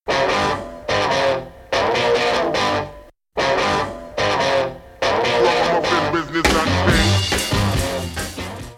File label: Guitar